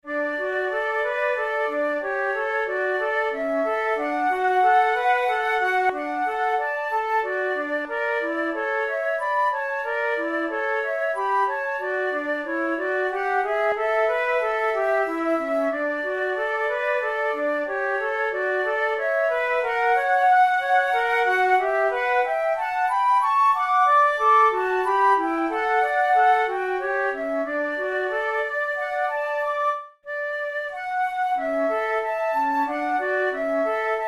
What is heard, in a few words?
flute; school of flute grade1